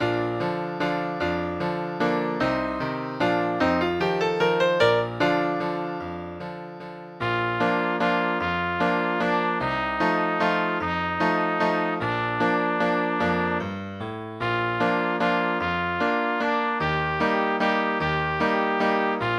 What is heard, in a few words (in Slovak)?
pre trúbku a klavír; Už mně koně vyvádějí